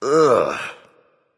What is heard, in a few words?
"ugh"; smite; merlin